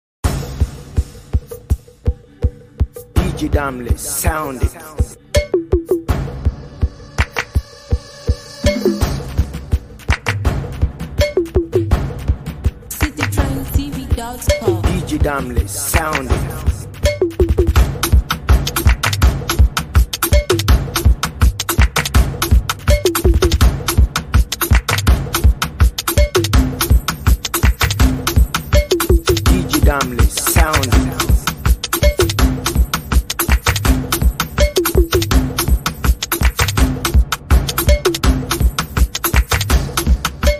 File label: Drum Riddim Free Beat